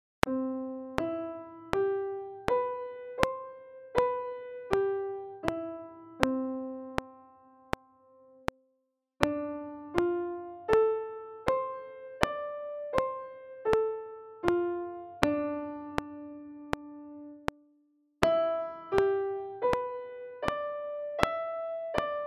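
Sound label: Cord Notes, harmonica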